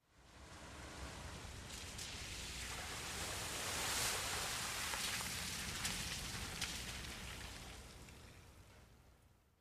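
Car By close up On Wet Pavement Medium, Slow Speed, Engine Off.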